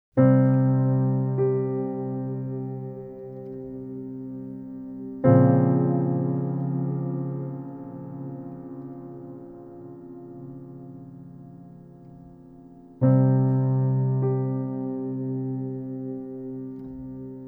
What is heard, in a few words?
Underscore, Piano Noir; Narrative Mind